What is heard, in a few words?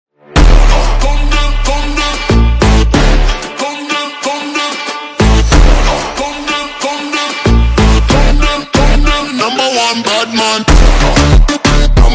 Phonk, Super Slowed